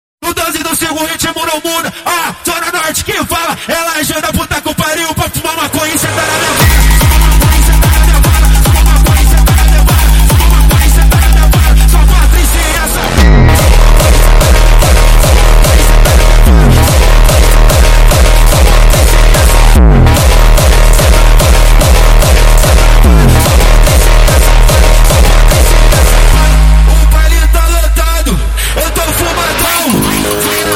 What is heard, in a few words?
hardstyle Sped Up